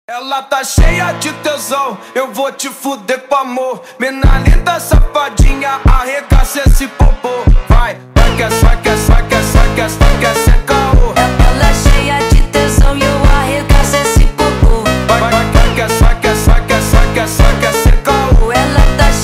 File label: funk